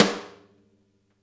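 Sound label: snare, Interactive Drum Kits; Sonic Reality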